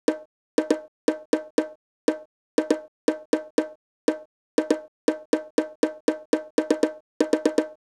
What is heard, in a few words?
Bongo Low; hydrogen